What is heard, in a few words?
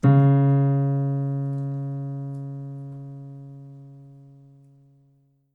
piano sample